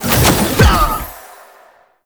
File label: Reroot Return B; Warframe; Nokko